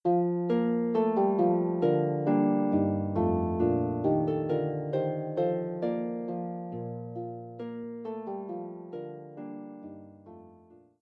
Harp

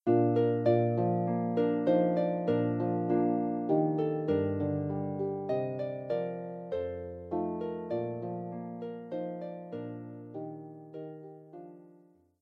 Harp

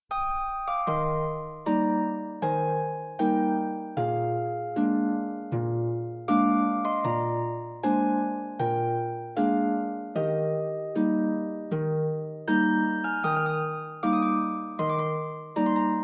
Famous Music for the Harp

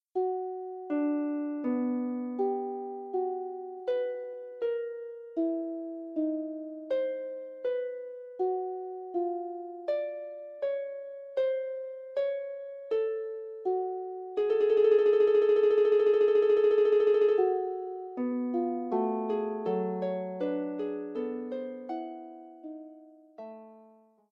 Fugue, Harp

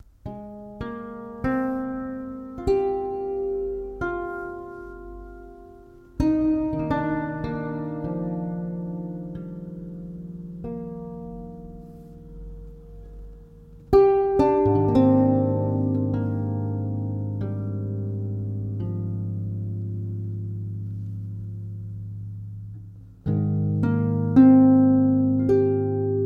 Paper Birds, solo lever harp; Inspired by a fond memory, this slow and thoughtful piece has a wandering melody

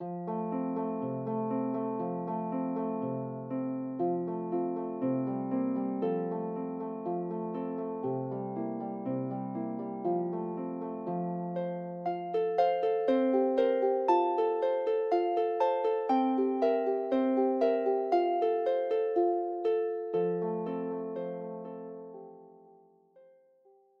Harp; Famous Music for the Harp Vol 6

